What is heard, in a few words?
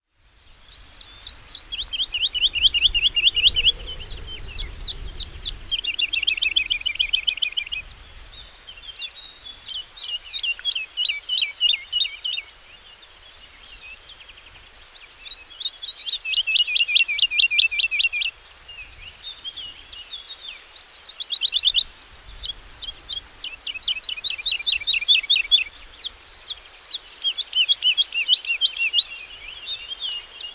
woodlark